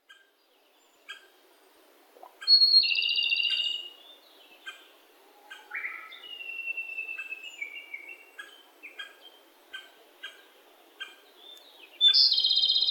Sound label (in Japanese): komadori